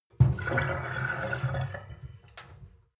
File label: sink filled pull plug short; industrie-wirtschaft; gastronomie